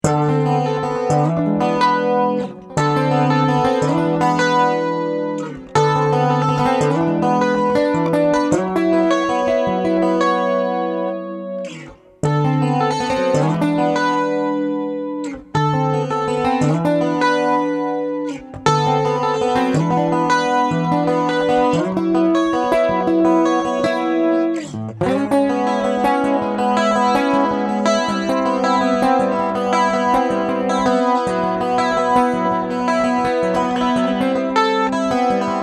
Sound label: clean